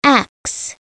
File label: AXE; SoftKey; WordBank